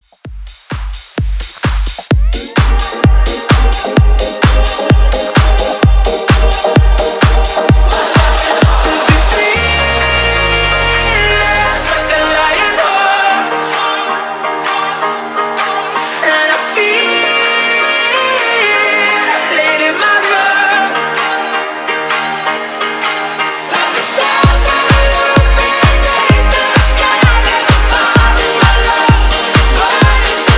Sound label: ReDrum Dance Top40 129 BPM